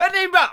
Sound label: Bihu Vocal Shout; waves of bihu vol 2; Gully Loops